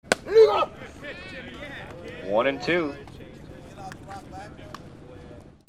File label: GAMES